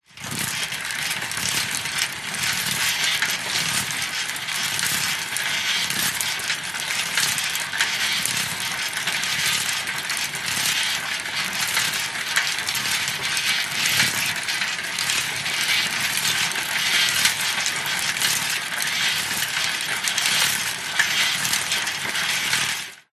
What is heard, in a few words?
vehicles bicycle pushbike pedaling med speed close to pedals